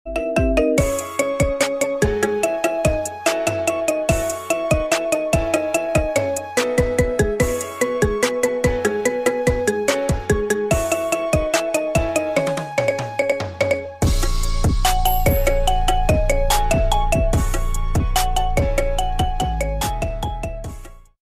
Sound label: Marimba Remix